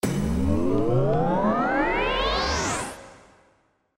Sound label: jackpot anticipation; slots; Fortune of Olympus